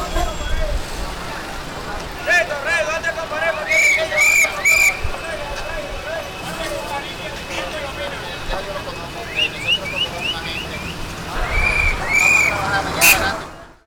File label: city caribean people, street traffic; best service Blue Box